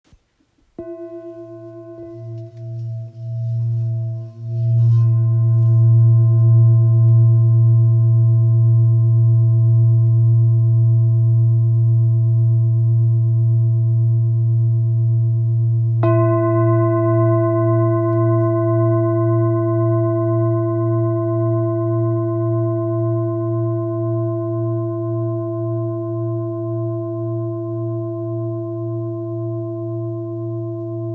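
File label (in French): Bol full moon grave la dièse 1.87kg, Bol chantant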